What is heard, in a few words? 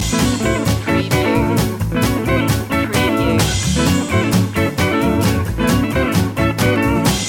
hop loop